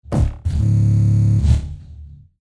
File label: Computer Interface Telemetry Bad Computer Processing Negative Low Soft Scifi Digital; Tom Hutchings